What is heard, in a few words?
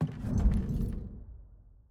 Monkey Enter; Puzzle